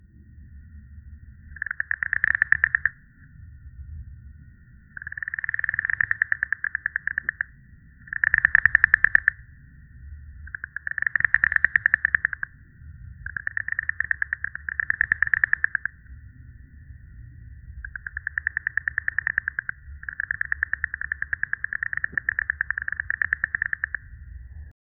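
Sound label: underwater life with movement; crab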